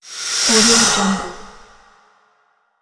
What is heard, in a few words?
last gasp